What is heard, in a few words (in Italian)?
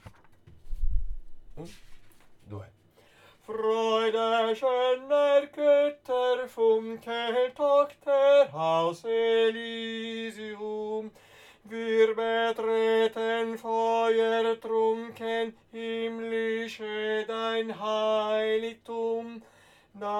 TENOR; inno alla gioia; cromatica